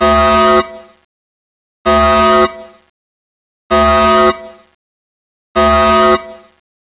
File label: core door alarm; dm criticality